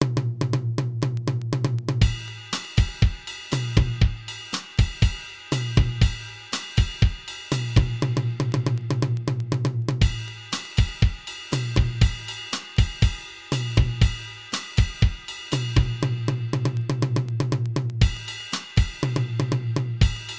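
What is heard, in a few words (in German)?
Latin Etüde; latin etueden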